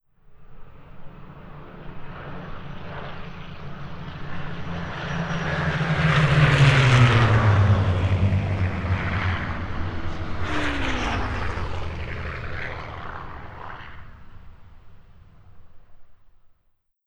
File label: Molokai Crop duster